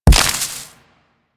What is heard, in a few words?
Mech Step Surface Foliage; Step Surfaces; Nechro